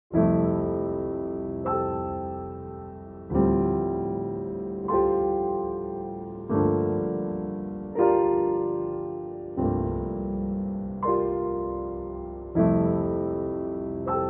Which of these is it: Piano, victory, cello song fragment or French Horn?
Piano